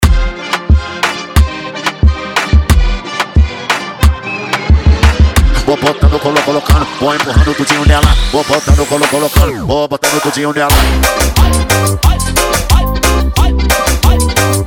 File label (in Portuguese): ROCK DOIDO; SUPER SEQUENCIA DE BOTACAO